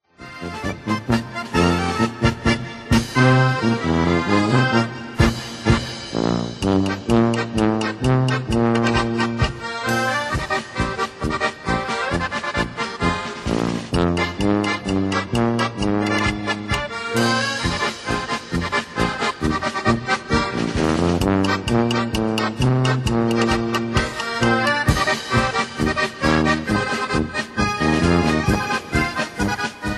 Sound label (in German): Akkordeon